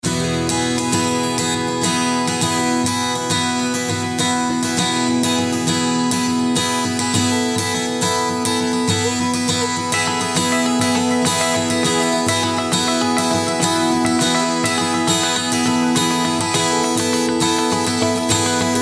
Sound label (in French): ACCOUSTIQUES HARMONIQUES